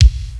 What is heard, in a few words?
kick